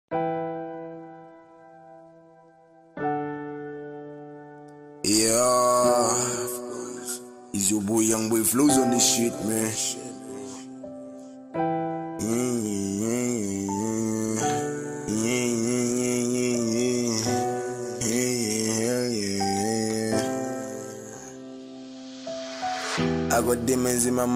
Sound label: sad hiphop; motivation